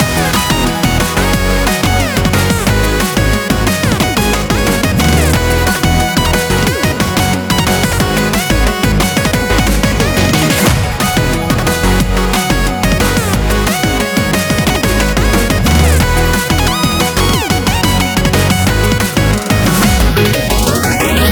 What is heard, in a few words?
chorus